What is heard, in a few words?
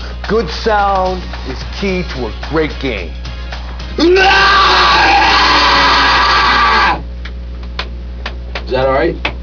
screams; bret; Sony Playstation; wm the game video